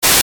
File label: retro; maoudamashii